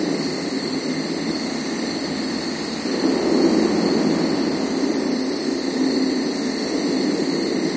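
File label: rural hilltop ambience cricket; Left 4 Dead 2; Source Engine; ambient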